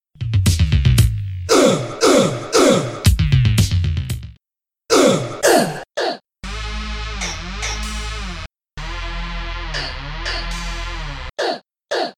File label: Pitched-up shout ('uh!')